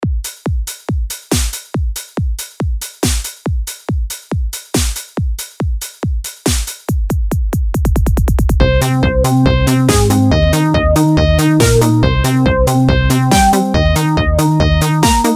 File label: Trance